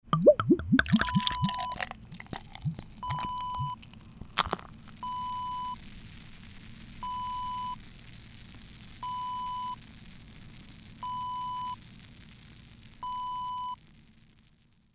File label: beer pour